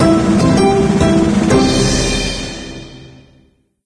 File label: Episode Unlocked Fanfare; Candy Crush Saga